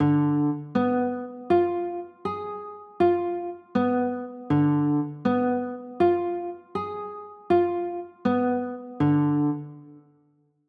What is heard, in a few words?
d dim, chords; d dim7